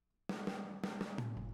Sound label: Boogaloo, Intro, Room, Drums, 82 BPM